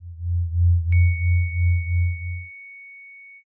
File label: eerie ambient